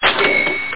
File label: cash